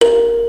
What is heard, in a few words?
Model Build